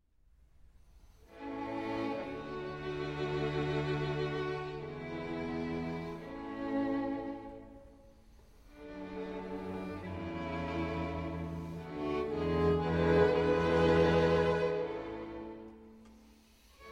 String Quartet No. 2 in A Minor, Adagio - Allegro Vivace